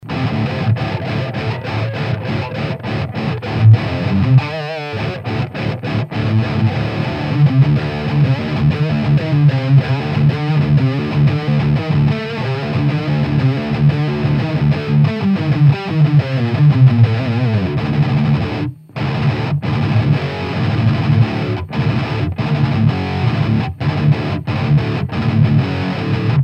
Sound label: Dist max Drop D; JFET